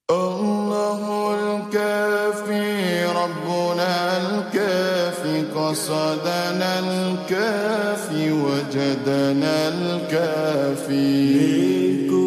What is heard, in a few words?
Nasheed slowed reverb